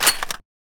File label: m4a1 slide, weapons; midnight guns